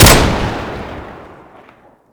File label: new rev; garrysmod